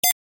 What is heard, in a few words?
Denied error beep warning ui